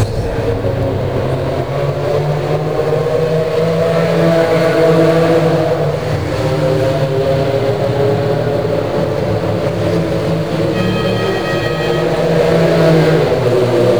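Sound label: porsche in pits; #83 Seikel Motor Sport Porsche into the pits.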